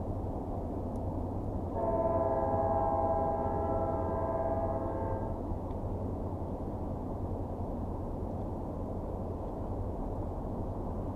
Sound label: Distant Train; visitdenmark; kystlandet; SFX Producer